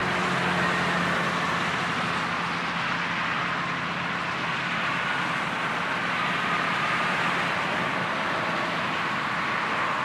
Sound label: traffic; hefner